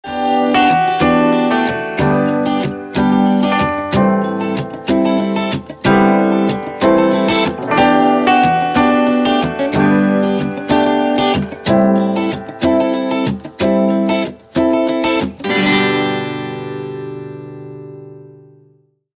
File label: huawei p20 ringtone; android